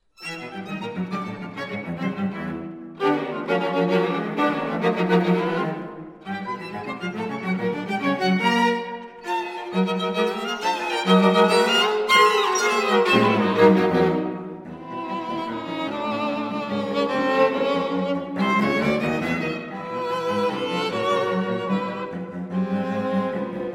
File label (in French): Quatuor a cordes; Choveaux